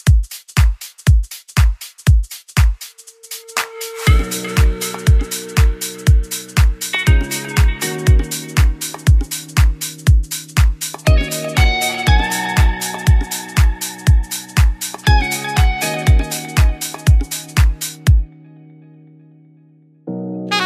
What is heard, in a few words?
Gig